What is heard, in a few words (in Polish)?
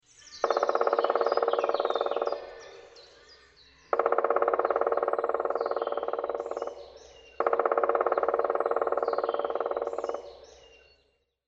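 dzięcioł; karty obrazkowe; przygotowania do zimy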